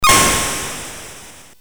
CSG8580R5, GAMES; Who Killed Cock Robi BASIC; HVSC

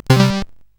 Fire Breath, CSG8580R5

